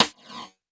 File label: Percussion; Vengeance Samples; VEE Percussion Instruments